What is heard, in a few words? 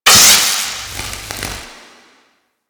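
Infested Machine Spark; Warframe; Corpus Infested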